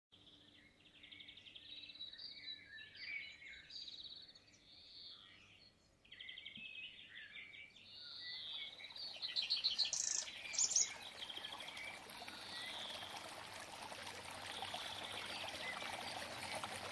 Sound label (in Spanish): Sonidos de naturaleza